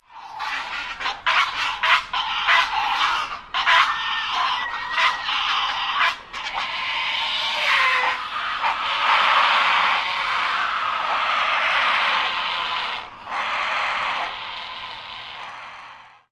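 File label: Great Blue Heron, other colony sounds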